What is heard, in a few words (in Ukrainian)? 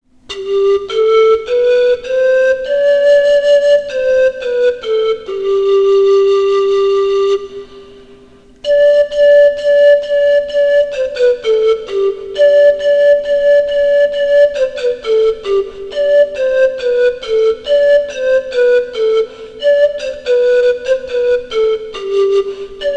Pastushya flejta